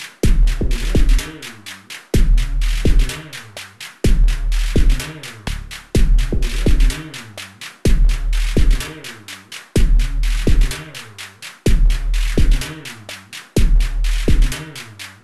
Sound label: drum sequence analog broken beat, 126; drum loops; cm